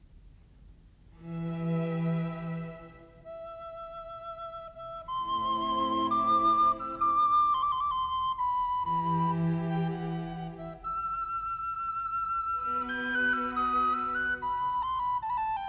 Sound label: Recorder Concerto; Handel; Op3-3; Marriner